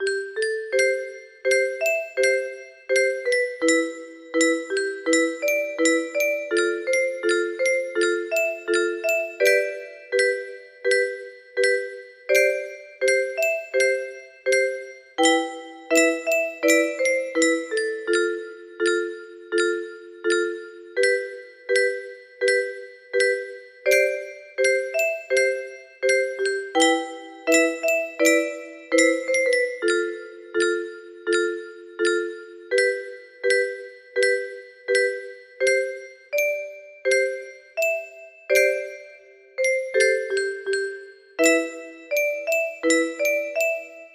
music box version

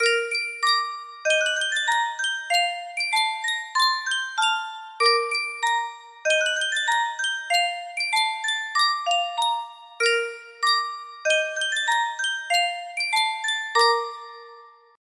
sankyo music box

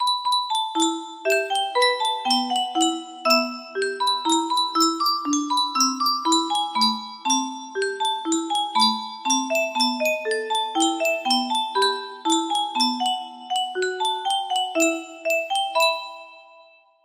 C 30 notes, music box melody

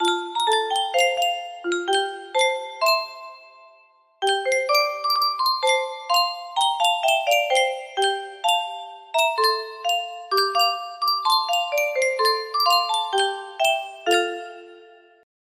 Yunsheng Music Box, Unknown Tune

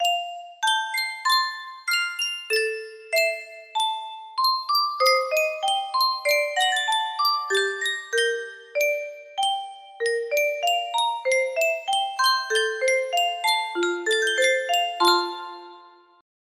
Yunsheng Custom Tune Music Box, music box melody

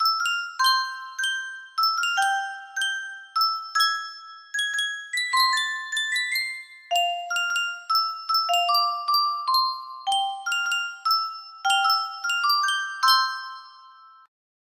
Sankyo music box, music box melody

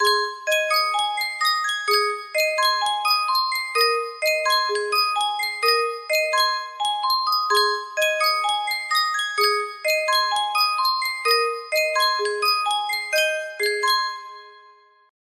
Sankyo Music Box

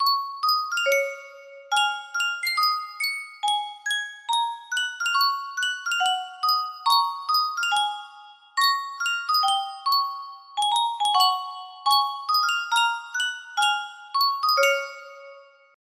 sankyo music box, melody

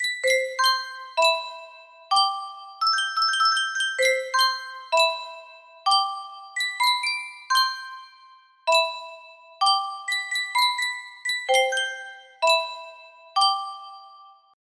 Sankyo custom tune music box, music box melody

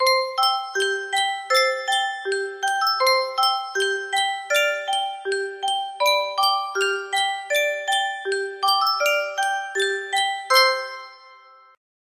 sankyo music box, melody

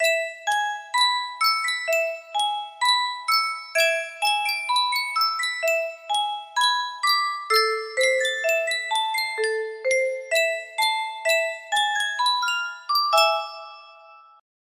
yunsheng custom tune music box

